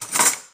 tools

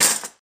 tools, wood metal; wood metal SFX

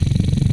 Helicopter Loop, Vehicles; retro arcade

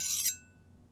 Small Knife - Scrape, kitchen knives; Micro Pack

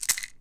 Prescription Shake, pill bottles

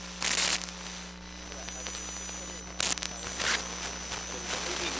Cell Microphone, electromagnetic sounds; Micro Pack; NazdyNate

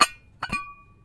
Ceramic Bowl; BB Retail Therapy Sample Pack

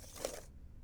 Dry Cut, paper cutter; Micro Pack

